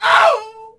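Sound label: Swordsman Die Vox; Civ4 warlords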